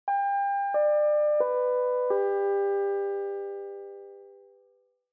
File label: Chime Announce